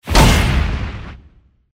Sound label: damage fire; hujia